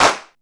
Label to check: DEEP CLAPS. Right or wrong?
Right